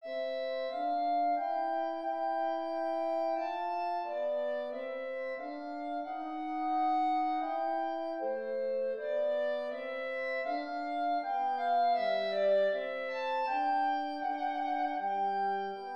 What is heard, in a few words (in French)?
menuet